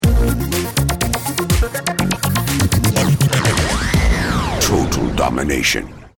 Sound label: round mvp anthem